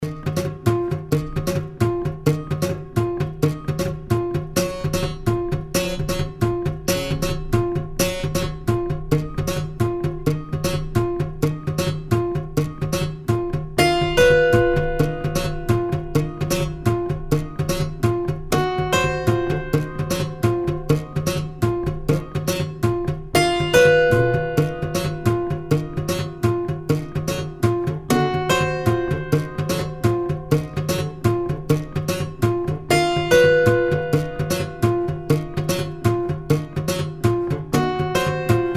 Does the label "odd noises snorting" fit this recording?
No